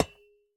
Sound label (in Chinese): 铜 破坏声